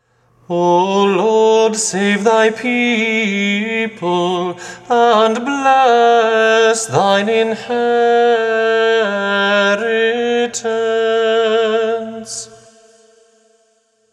Res prok alleliua tone 6, Serbian chant

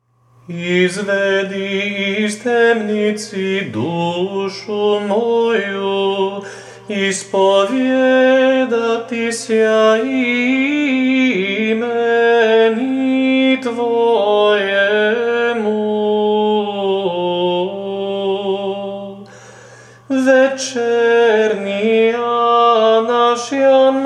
Stihir prvi glas, Serbian chant

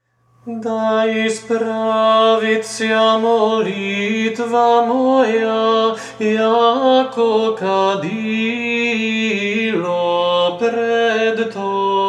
Da Ispravitsja treci glas, Serbian chant

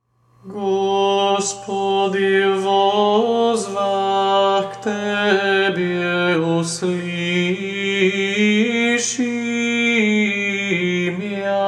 Gospodi Vozvah peti glas, Serbian chant

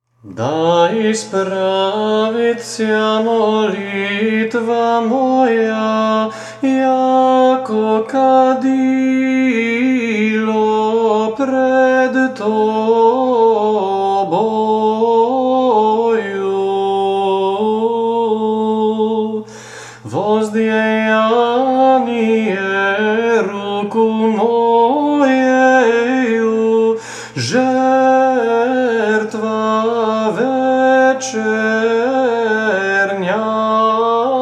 Da ispravitsja cetvrti glas, Serbian chant

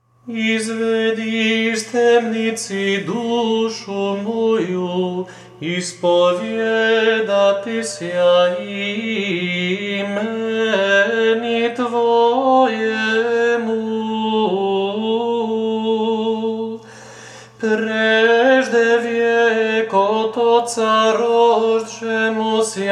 Stihir drugi glas, Serbian chant

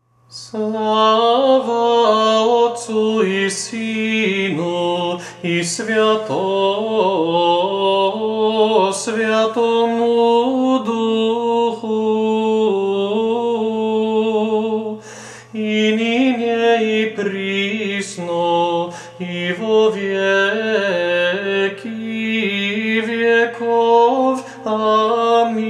Slava Dogmatik drugi glas, Serbian chant

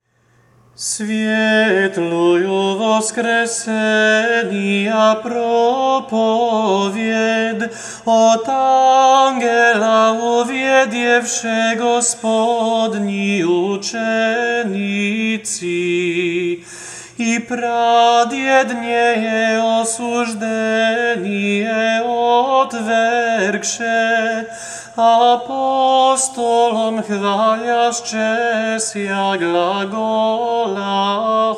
Vask Trop glas 4, Serbian chant

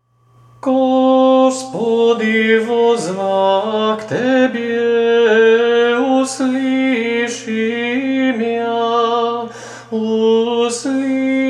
Gospodi Vozvah prvi glas, Serbian chant

